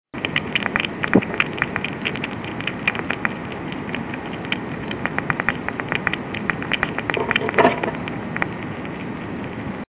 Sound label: Eating And Purring